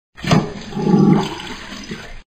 Klospuelung; Haushalt

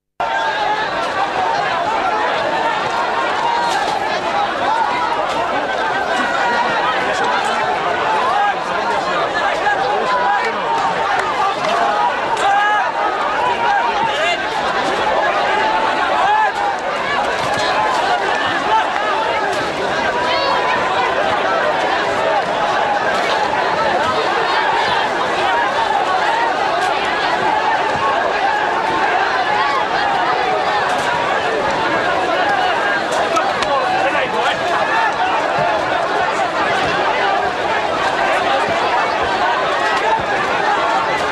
Aufruhr; Menschen

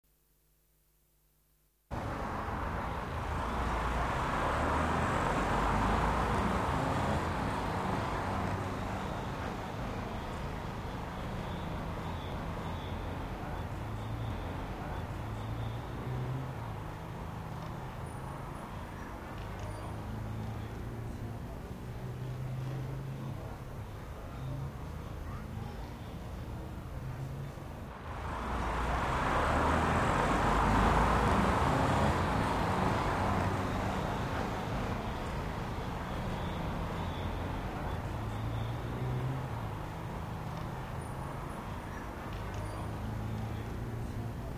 Verkehrsatmo

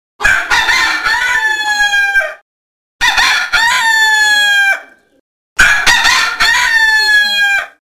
Hahn; Tiere

